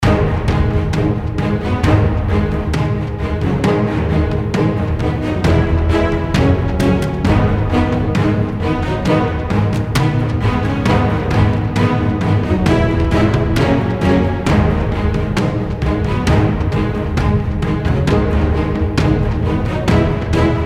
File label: battle loop